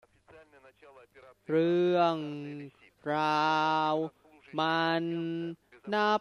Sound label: song